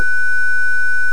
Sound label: steady